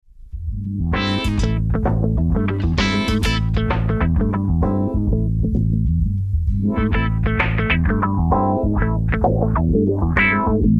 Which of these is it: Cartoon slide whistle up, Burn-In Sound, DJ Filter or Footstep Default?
DJ Filter